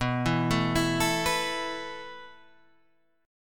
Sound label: E Suspended 4th B